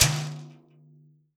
ocean, World Percussion; World Instruments 2; PS MIXED PERCUSSION

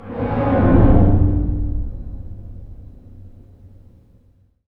STR HIT, String FX; Roland - LA Composer vol. 2

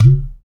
UDU, African

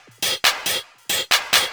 percussion loops, 138, Techno

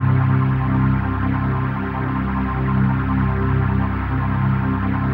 PHASE PAD

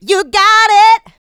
Female Vocals; Houseworx

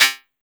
PERC, Drum & Bass, 160 BPM; Ueberschall; Drum & Bass Flash Trackz

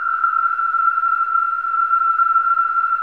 WHISTLING; Spectrasonics; Symphony of Voices

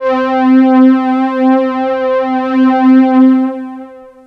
JX3P STRINGS, Roland JX-3P; TECHNO-BWARE

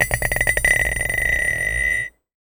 Small Anvil, contact mic, Metalics

